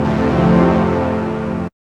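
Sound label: synth pad; E-Lab; X Static Goldmine 1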